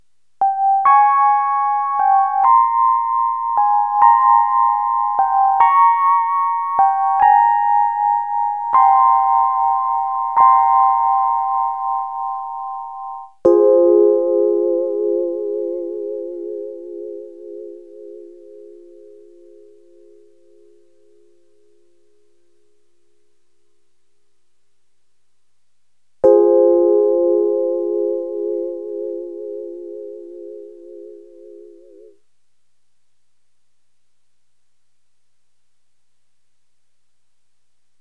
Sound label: rhodes solo; porkys adventure